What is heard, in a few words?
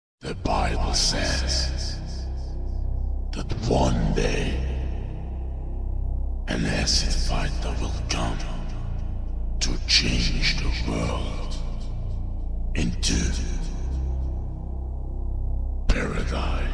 Acid Fighter